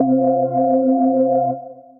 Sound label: Gospel, Piano & Keys; Gospel Bars; Ableton; Beat Tools